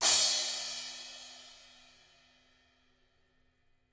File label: CRA 16TH